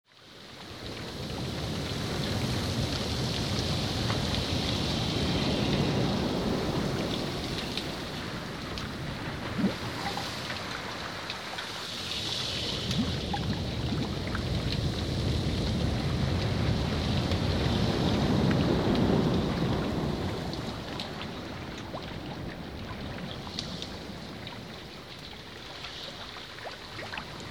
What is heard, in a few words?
Underwater Amb no pan